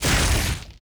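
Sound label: screen break, Impact; Cars; XBOX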